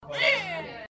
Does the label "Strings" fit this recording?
No